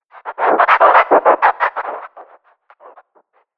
Scratch It Up Future Dj, dj scratch; Dj Sound Effects; Sci-Fi

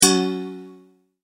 multimedia tone exclamation; McKinney Sound

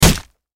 Impact Hit Juicy Wet Hard Splat; Punches and Hits; Combat; Blastwave

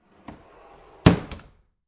Door Close Sliding Bathroom

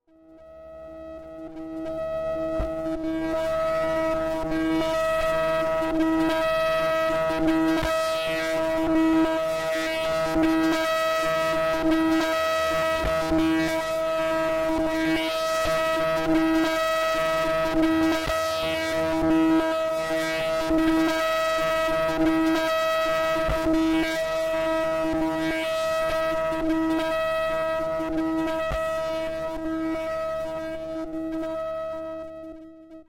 Slow And Painful Laser Death Drone; Laser Gun Sound Effects; science fiction sound effect

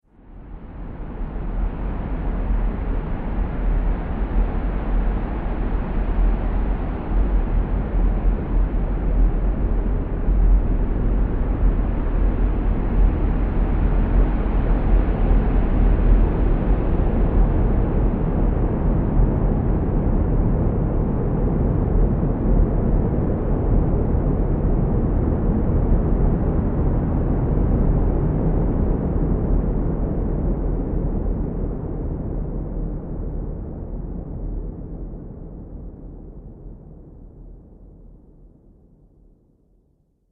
Drone Shadow Of The Volcano

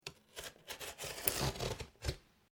Cardboard cut; Material components for constructing more complex sounds; Materials

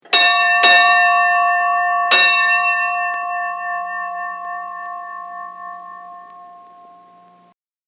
Ship Bell 4 Rings; Ship Bell 3 Rings; Bells (Sound Effects:Musical)

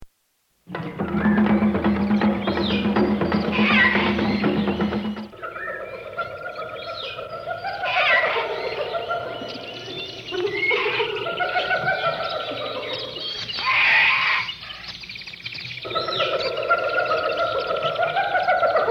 Jungle Animal Sounds; Nature; Bobby Cole

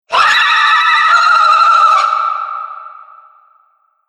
Scream Banshee, monster; Monster Sound Effects; horror sound effects

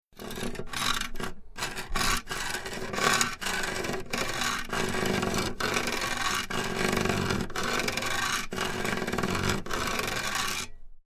Small Metal Chain Rubbing; Chain sounds; Metal Sound Effects; LYN104 package of Chain sounds

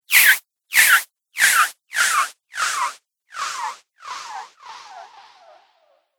Magical Air

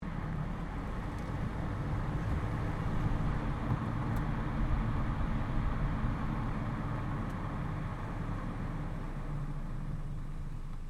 Car tyre noise slow; Cars; Vehicles

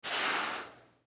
Sand Dig Hand, Sand Dig Hand Single Movement; Playground Sound Effects; Foley

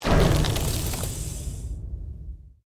Created Magic Spell Ice Bolt

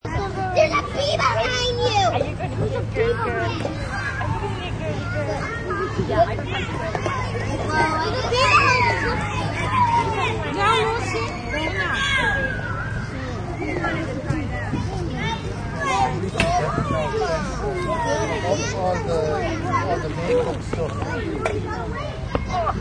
Kids Families Playground, Kids playing; Backgrounds; Kids and Families at Playground; Playgrounds

